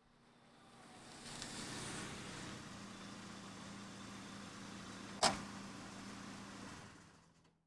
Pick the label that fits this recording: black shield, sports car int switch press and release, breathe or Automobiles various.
Automobiles various